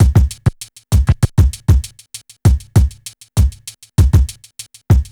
BEAT, Hip Hop; USB Soundscan vol.34; Burning Grunge Hip Hop; AKAI; TIMBA

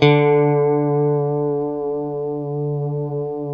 D 3, strat, JC120; Spectrasonics; Hans Zimmer Guitars VOL-1; JC-STRAT MF

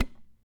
CHARANGO FX; Spectrasonics; Hans Zimmer Guitars VOL-2

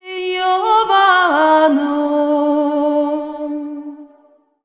VOX, Balkanish Traditions; USB Soundscan vol.63; AKAI

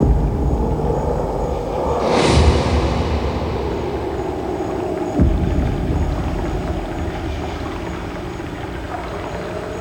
ambience; Sonic Foundry; Sony Creative Software; Ambient Grooves

